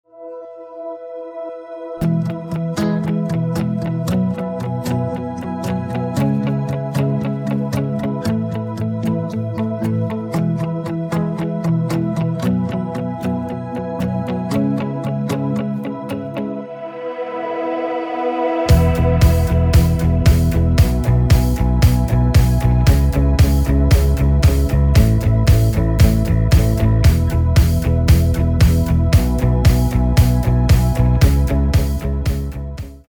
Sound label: heeltemal stom jakkals vibes, afrikaans pop